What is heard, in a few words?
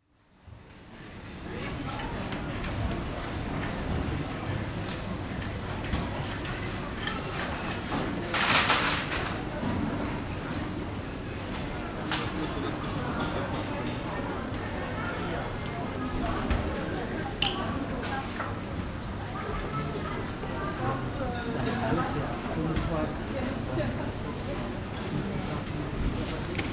supermarket